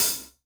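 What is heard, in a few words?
open hi-hat Acoustic Natural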